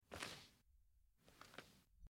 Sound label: footstep mud; weather and nature